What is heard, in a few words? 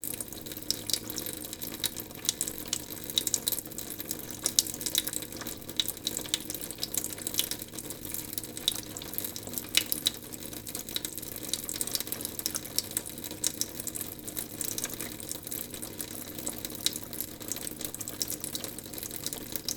household sink metal tap run slow; sound effects one